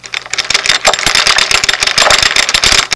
WINCH

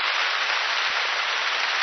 medium rain

